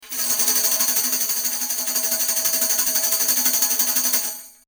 Tambourin 160cm front; Schoeps CMC6MK2S

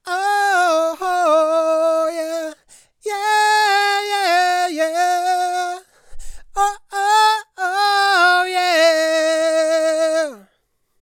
Vocals Weniger Gain

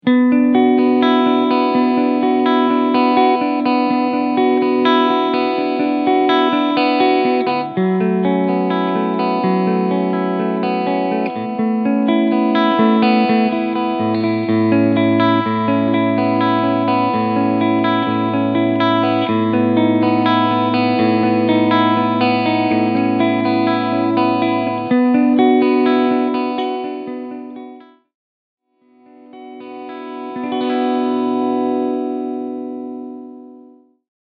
PRS SE McCarty 594 Vintage SB Clean Sound beide PUs SC Mode

